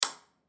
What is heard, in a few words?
Ship Light Switch On; Ship Light Switch Off; Transportation Watercraft